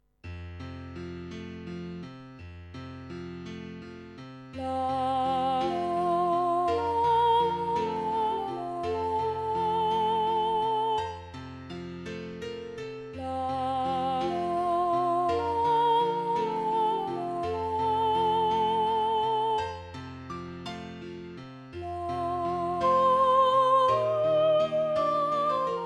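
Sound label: bird fly high soprano, ladies choir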